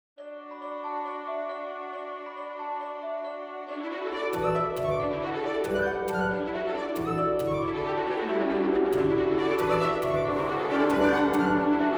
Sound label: Film Scoring cartoon